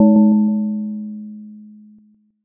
gong

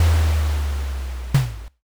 drawbridge

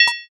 equip

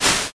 swoosh

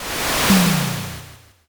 fire magic

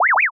scratch

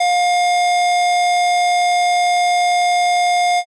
ecg flat

